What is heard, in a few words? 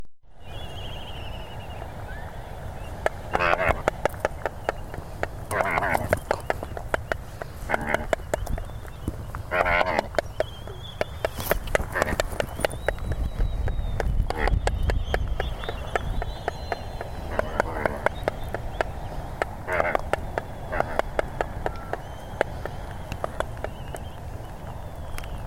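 Stay Away From My Nest, Albatross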